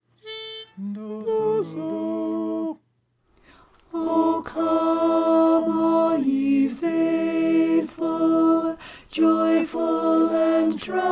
alto

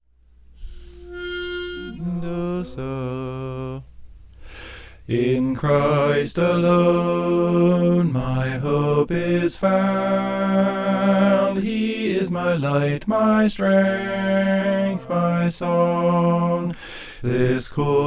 soprano

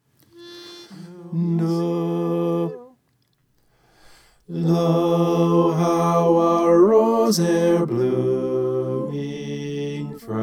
bass

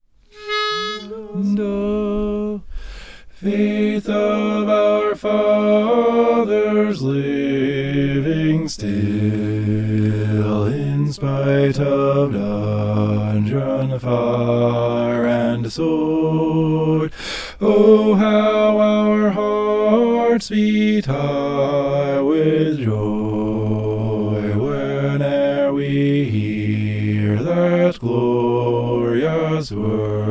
bass; faith of our fathers

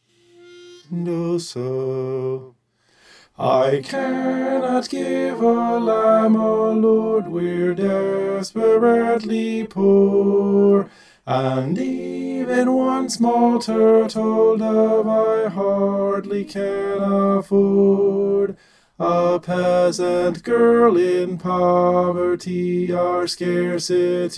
tenor

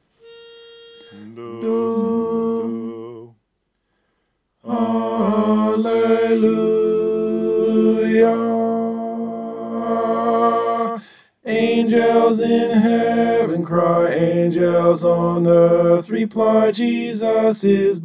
tenor

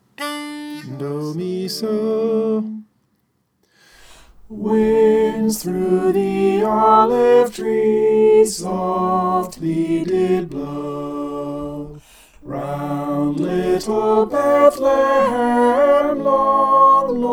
soprano

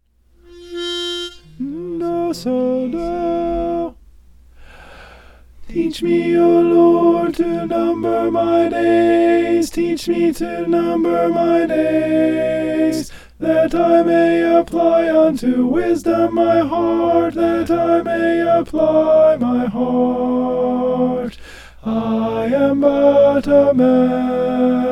teach me o lord alto

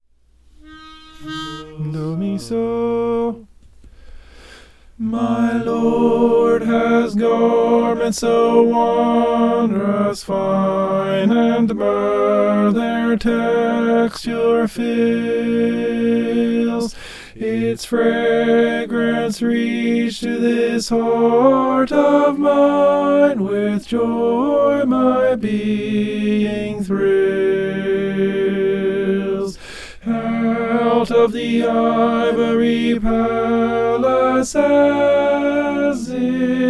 tenor

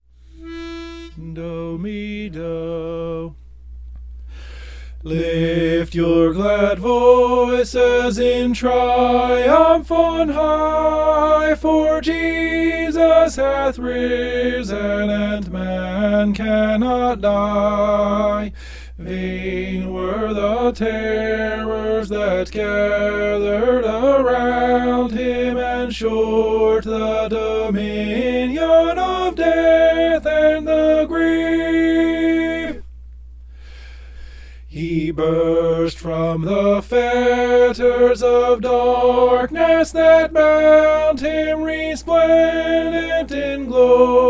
soprano

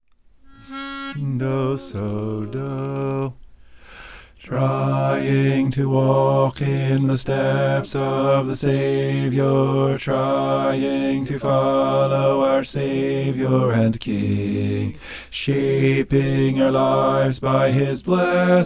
bass

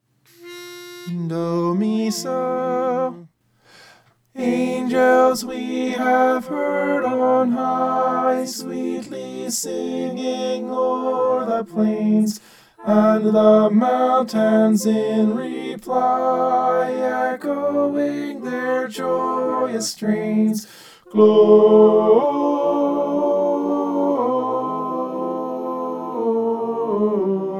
tenor

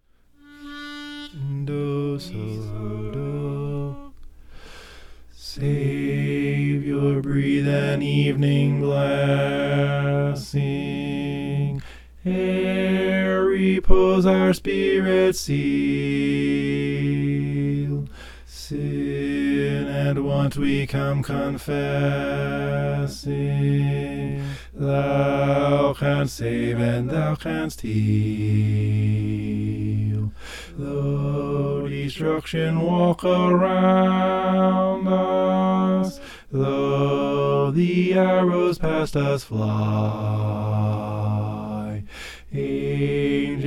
savior breathe an evening bass

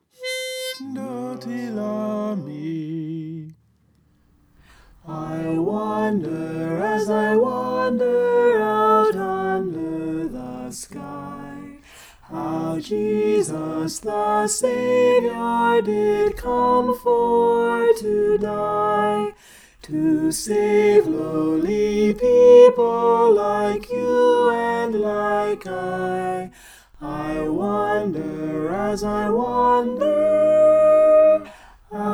soprano

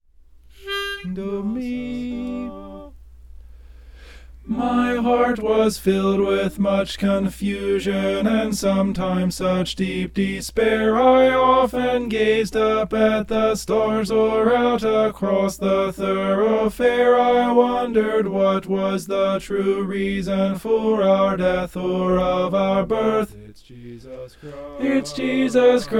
soprano

